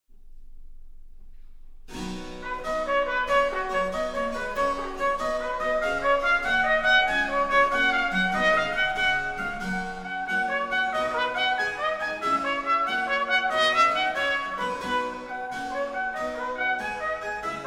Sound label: trumpet; Con voce festiva, A. Scarlatti